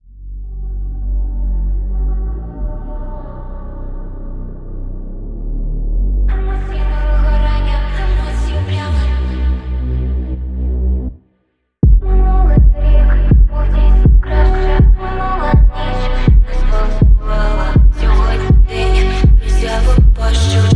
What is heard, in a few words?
Slowed Reverb